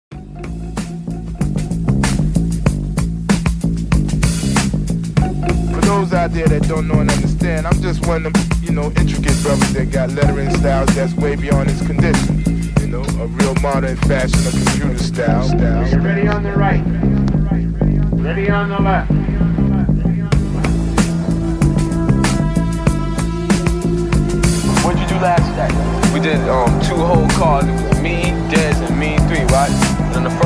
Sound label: Computer Rock